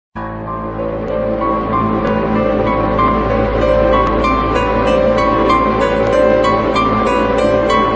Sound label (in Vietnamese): Nhac chuong tieng dia nguc kinh di